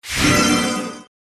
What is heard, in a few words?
Earth Spirit Block